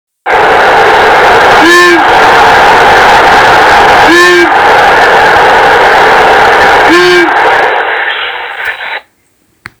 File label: Long eared male hoot